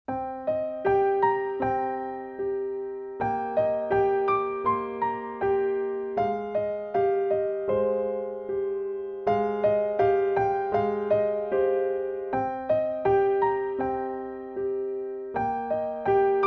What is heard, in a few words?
solo piano